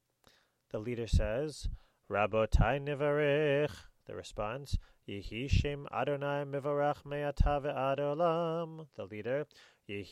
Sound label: birkat hamazon zimun